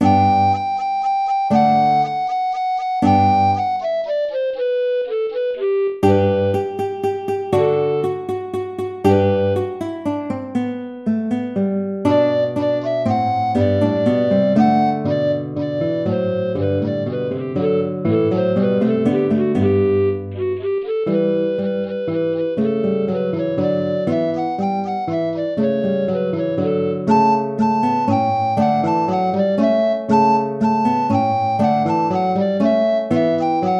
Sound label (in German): alter ungarischer tanz